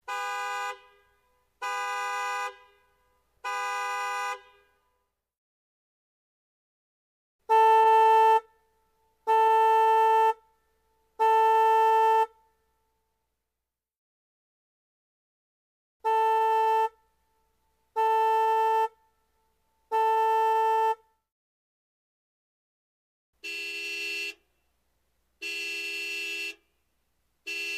Car Horn Medium Cu Var, american

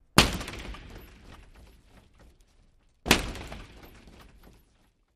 Hockey Hit Heavy

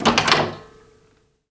Door Open Lots Latch, latches & bolts

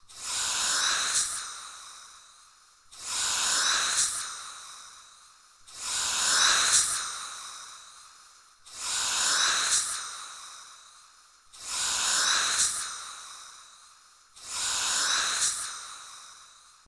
Dental Machine Air Suck

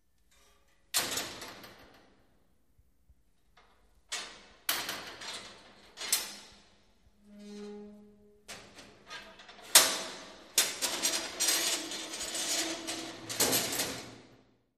Metal Gate Rolling

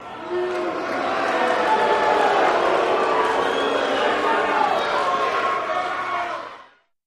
Crowd Boos Short Burst

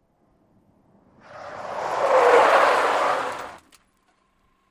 Sm Car 180 Skid, compact

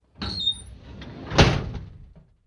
Drawer Metal Close

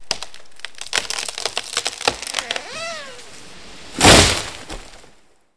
Trees Falling Tree Br

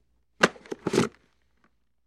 Football Helmet

